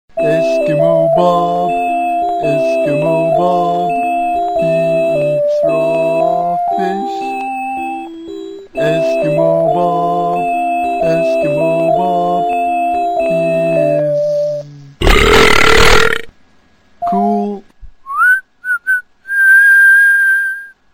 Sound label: Theme Music; English Theme Music; Ding diddle ding ding, ding diddle ding ding, ding diddle ding ding ding